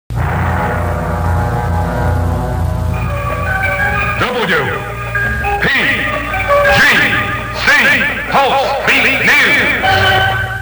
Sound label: pulsebeat news stager; sweeper